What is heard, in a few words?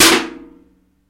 AC Duct Noise, Rattle; Small Impacts